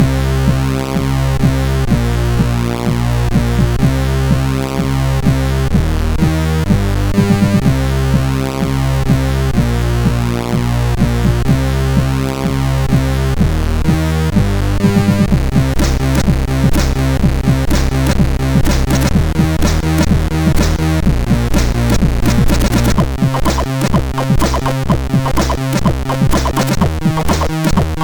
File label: Beat n Tech, sid MOS6581R4